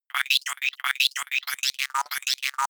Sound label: robot small helping droid